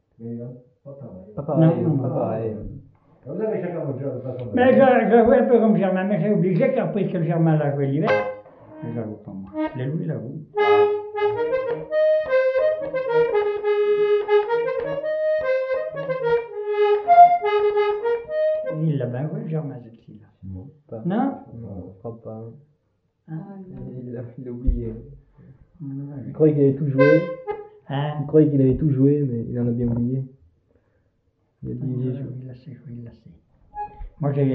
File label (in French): valse